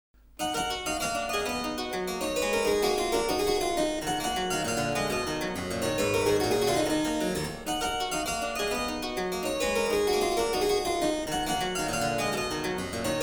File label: gigue, clavecin; pieces de clavecin